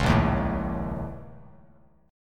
A sharp Minor Major 7th Double Flat 5th, A sharp, down, Bass Standard; A#mM7bb5 strummed; JGuitar